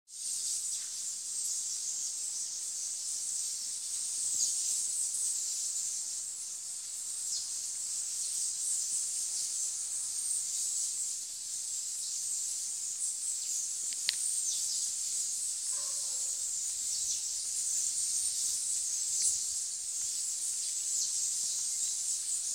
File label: Birds Feeding